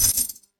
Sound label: shop buy; NPC Dialogue